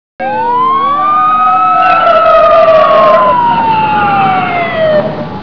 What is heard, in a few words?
police siren